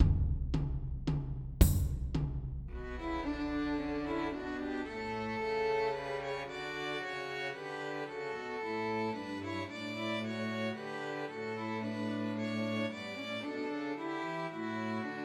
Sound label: Christmas Trios for Two Violins and Cello; The First Nowell